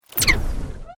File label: Sweep Mechanical Medium